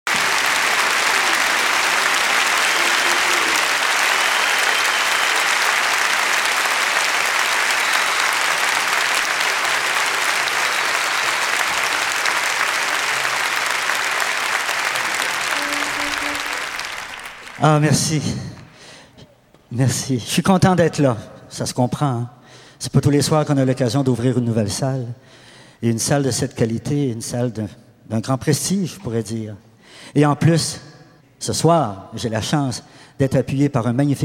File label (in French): Applaudissements Et Présentation